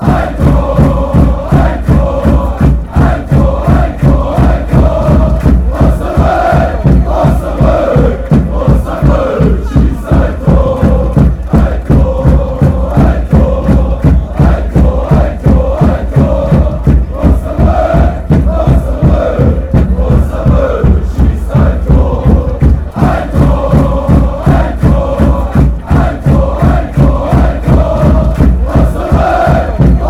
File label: a goal, fanchants